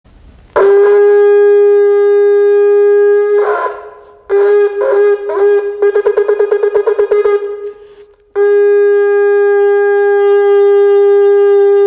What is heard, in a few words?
Shofar Blowing; Yom Teruah; NVU The Aleph-Tav Project